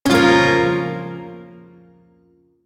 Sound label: Shawzin Hirajoshi Chord; E; Warframe; Duviri Shawzin